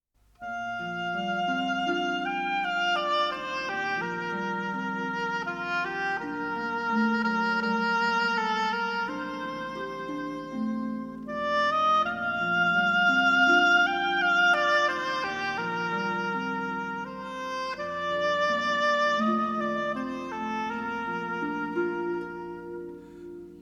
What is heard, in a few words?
folk song